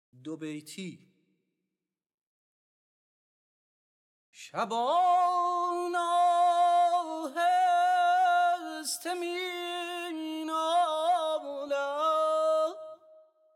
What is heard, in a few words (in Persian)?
Dobeyti Shur Radif-e Karimi; Dastgâh Shur